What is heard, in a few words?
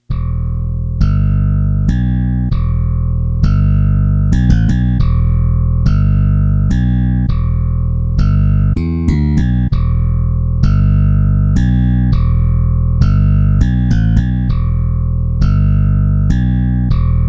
bass